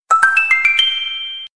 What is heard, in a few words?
cute blinky sms ringtone